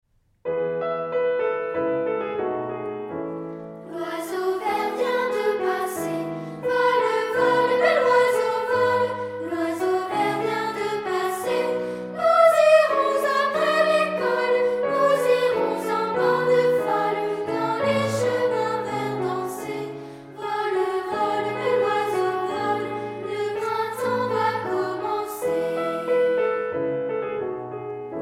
voix piano

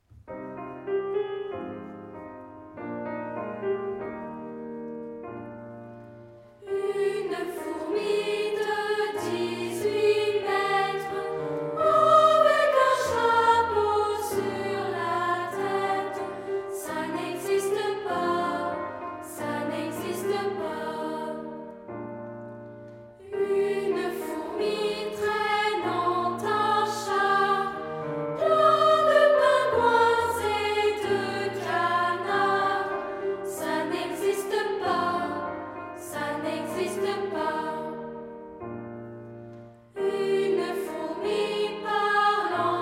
tutti; fourmi

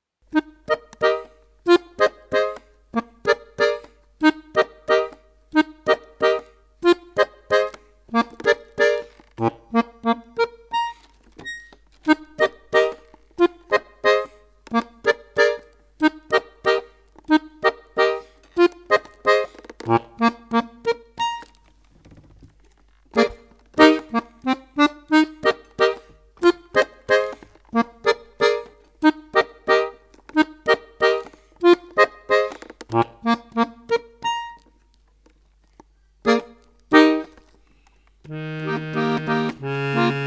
accordeon seul

